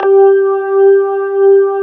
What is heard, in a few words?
B3 HAM 1 G3 -L, Keyboards Old School; USB Soundscan vol.09; AKAI